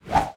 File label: Mantis Heavy Attack Up